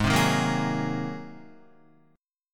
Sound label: G sharp 9th G sharp Guitar; G#9 strummed; JGuitar